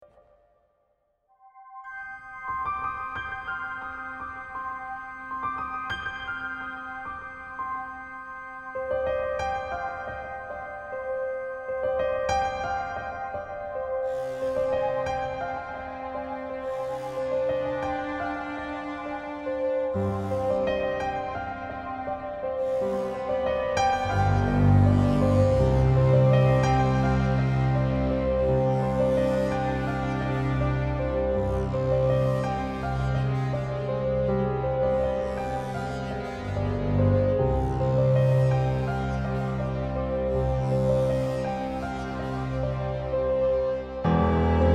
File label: Dark Walk; Throne of Lies The Online Game of Deceit